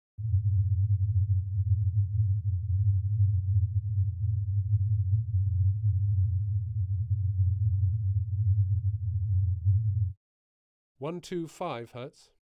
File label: 1,3 octave band, 100 Hz